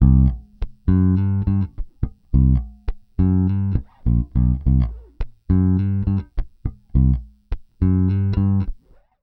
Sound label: Pop, Bass